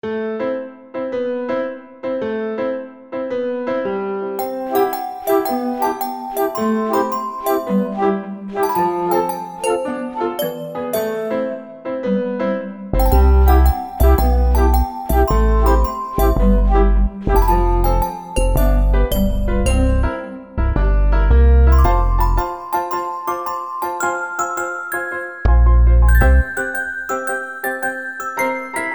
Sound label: Silly melody